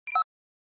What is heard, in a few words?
Flash Beep Elect Buzz, flash beep